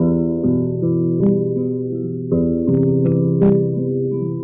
guitar, Jazzmatazz loops

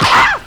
"ah" pain, Voice; ooh ahh

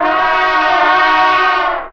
careful two notes really, Horns

